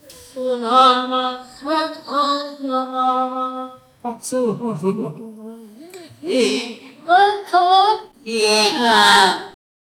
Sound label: womens hand bangles movem, jewelry